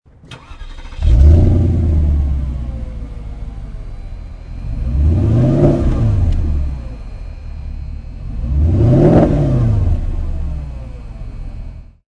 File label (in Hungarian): inditas gazfroccsek, porsche cayenne; Indítás, gázföccsek